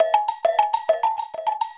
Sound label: Bell African, Percussion Loops